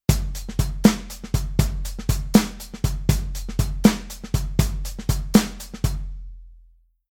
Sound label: Rhythm 12 8